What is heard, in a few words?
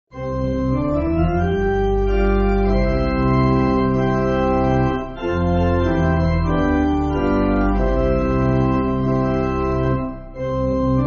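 Pipe Organ, church music; To Be Your Witness; Rakotoarivony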